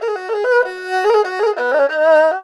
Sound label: ERHU LICKS